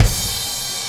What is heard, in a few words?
Combo Shard, Processed Drumkits; Sonic Foundry (Sony Creative Software) - Processed Drumkits Zero Gravity Beats; Shards